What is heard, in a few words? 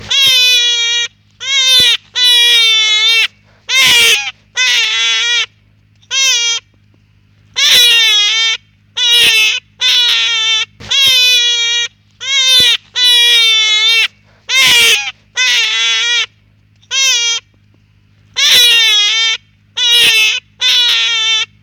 Hare UK